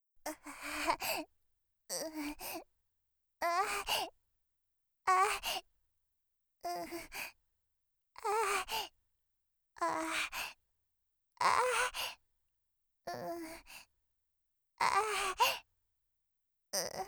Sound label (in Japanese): 喘ぎ声 小